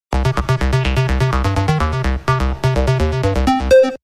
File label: Coolest 3d Sms Tone